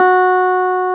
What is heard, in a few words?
CLAV F#4; Sonic Reality; Vintage Timetraveler; CLAV PIANET